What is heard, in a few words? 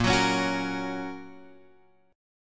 A sharp Minor Sharp 5th A sharp down Guitar Drop D